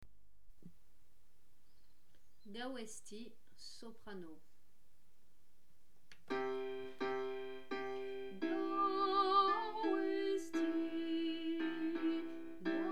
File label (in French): Training Soprano Chanté; Gau Ezti; soprani